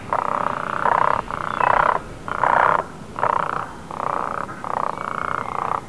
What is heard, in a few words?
Antropoides virgo